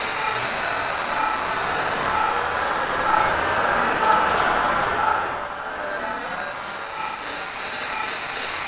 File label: Drone Ambient; Drums & Drones